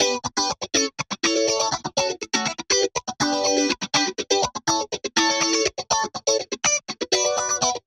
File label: guit orig lc